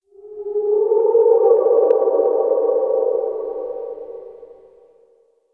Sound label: Exterior Eerie, Ambience; Warframe; Duviri